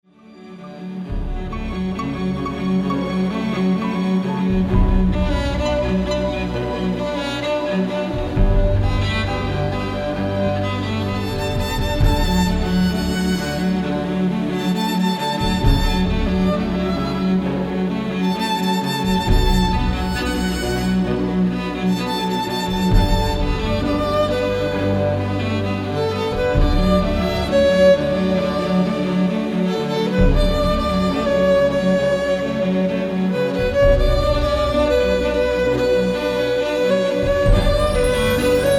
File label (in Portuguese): Violino e Cello